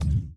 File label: Hand Paddle Attack Light